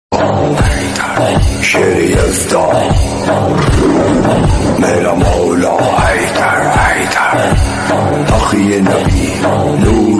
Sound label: islamic song